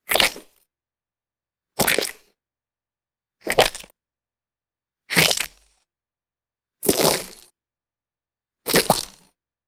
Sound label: short wet mud splats; liquid